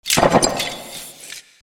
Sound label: struggle; tridroidznnpc